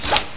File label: SWIPE